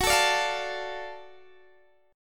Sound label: Gb Minor Major 7th Sharp 5th Gb, down, Guitar, Open D